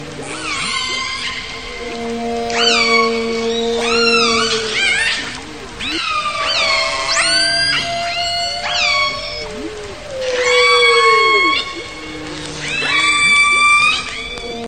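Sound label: orca; kosatka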